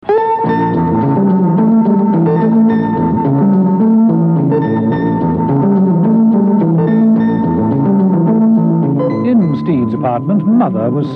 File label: incidental jazz cellar